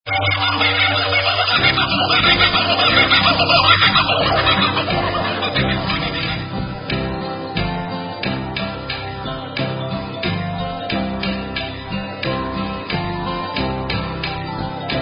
Karaoke Songs; Girl Scouts

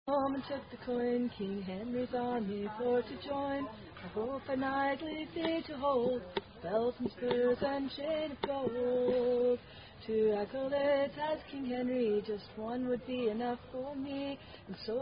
Song; Agincourt; SCA Song

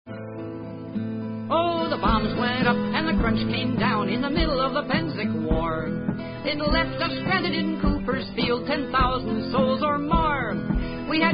Filk Song; Serious Steel

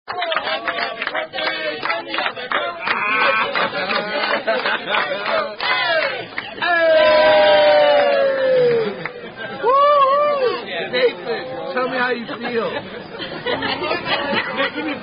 Happy Happy Birthday; dinner At The Outback

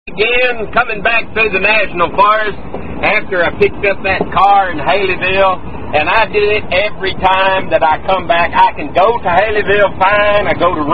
Song

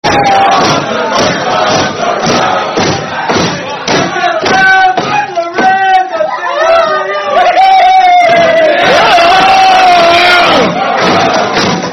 British Pub Sing Along Song; Tampax Factory; When The End Of The Month Rolls Around

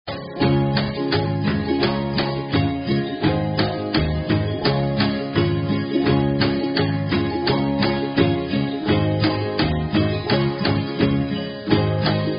Philippine Folk Song Karaoke; Girl Scouts

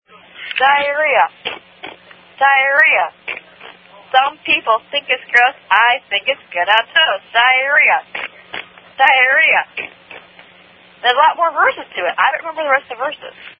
Diarrhea [Chant Fragment]

